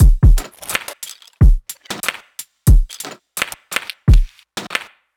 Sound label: lofi drum 90 BPM